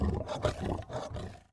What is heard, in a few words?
RUN